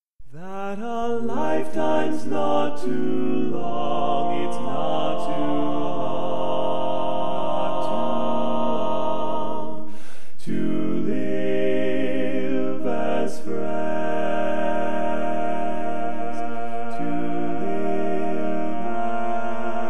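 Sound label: baritone, barbershop tag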